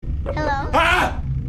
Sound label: Scream; Markiplier